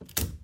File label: switch; Barotrauma; EK modern